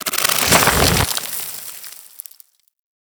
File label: Micro Burrow Enter; Warframe; Corpus; Arachnoid Micro; Abilities